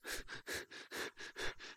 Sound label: Run Grunts; SyrinScape; City gone mad